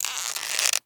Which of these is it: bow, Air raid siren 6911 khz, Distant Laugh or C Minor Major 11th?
bow